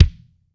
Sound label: KICK, LA KICK; AKAI S6000 CD-ROM - Volume 3